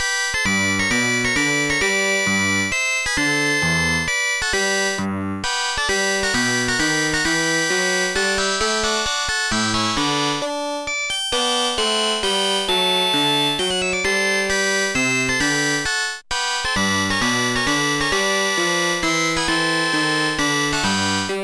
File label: minuet; Deluxe Music Construction Set